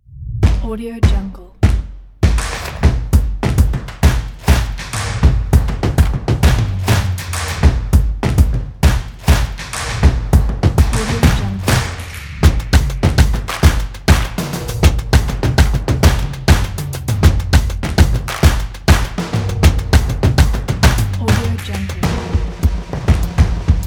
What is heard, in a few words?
drums percussion